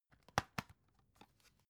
dvd case open

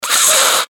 sniff

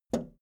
video tape player plastic rewind release

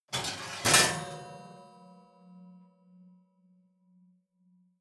oven baking sheet draw out

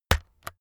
keyboard external key hit slow

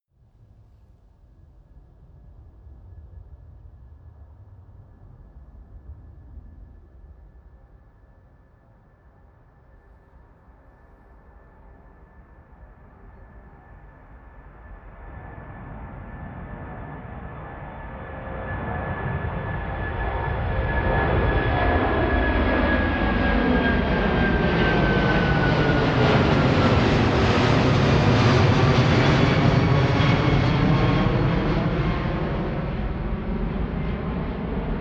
propeller plane flyby land tonal

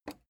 plastic button small simple release

